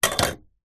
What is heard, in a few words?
bbq tongs set down on wood; household; zapsplat